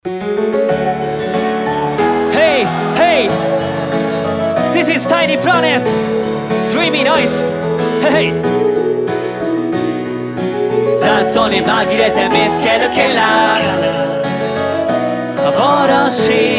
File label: Dreamy Noise